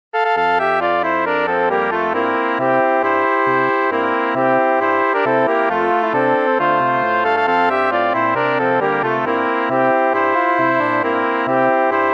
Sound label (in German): Werfner Polka